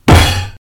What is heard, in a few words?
Hand On Table